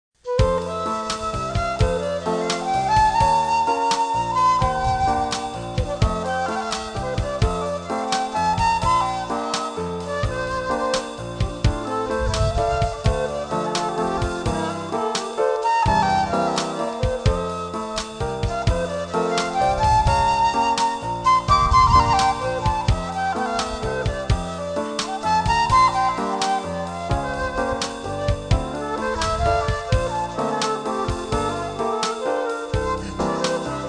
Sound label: waltz major